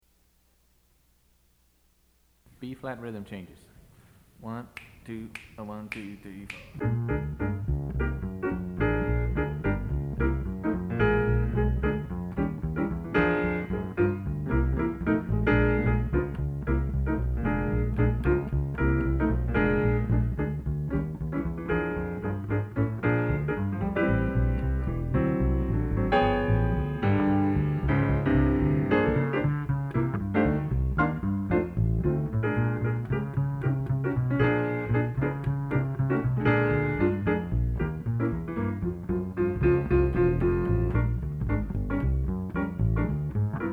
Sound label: drums rhythm changes; Jazz Studies Auditions